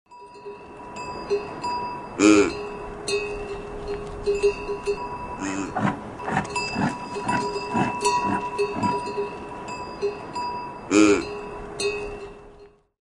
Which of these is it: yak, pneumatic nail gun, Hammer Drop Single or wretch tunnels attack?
yak